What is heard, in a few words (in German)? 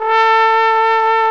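POSAUNE VIBRATO; Best Service; Alpine Volksmusik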